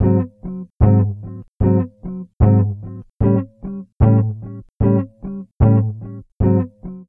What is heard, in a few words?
Guitars, E A; Zero-G; Total Drum Bass